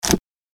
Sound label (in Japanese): 自販機 ボタン 押す